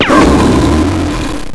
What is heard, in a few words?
pain; Alien Arena; nemesis